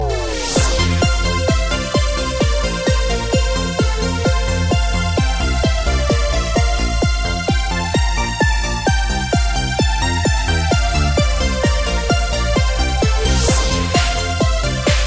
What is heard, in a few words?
Techno